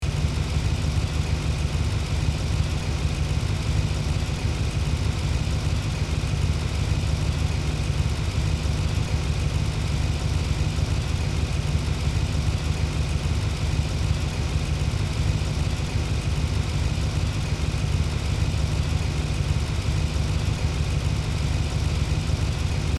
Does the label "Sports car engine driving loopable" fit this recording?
Yes